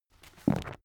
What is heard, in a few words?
book browse smooth